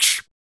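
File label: vox percussion, Vocal Planet Jazz FX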